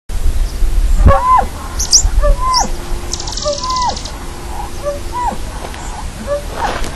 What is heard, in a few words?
Cinclodes comechingonus; PN Condorito; Jorge La Grotteria